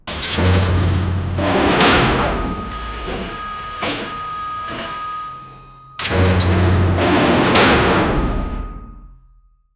sound of a massive indust, airlock

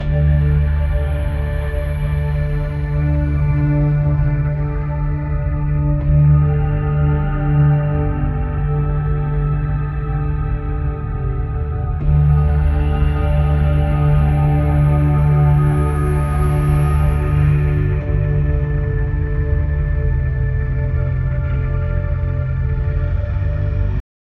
seamless looping, menu

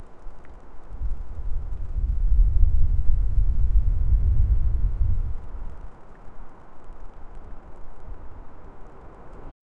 crack of twisted metal

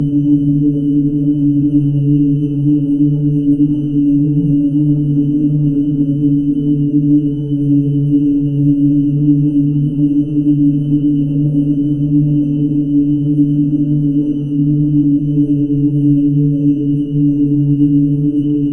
gentle twinkling sparkle

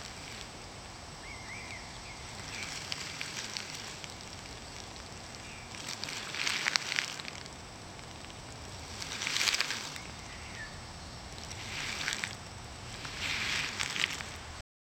explore the soft sounds, garden

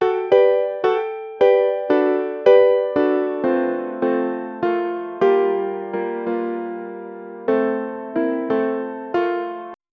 jazzy lounge piano music

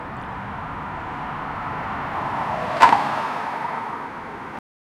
car driving over a bridge, wooden planks

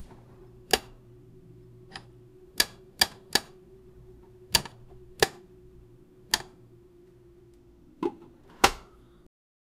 sound of the portafilter, coffee

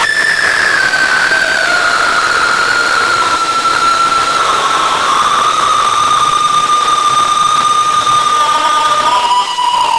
inhuman scream of fear, distortion

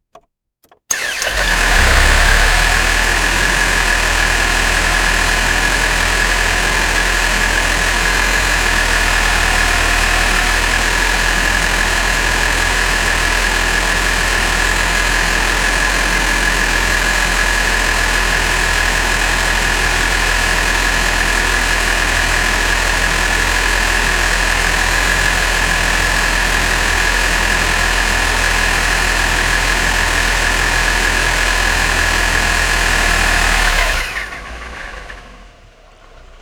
ignition takes a little, alternator

